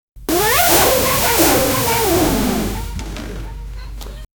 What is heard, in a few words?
jump cartoon boy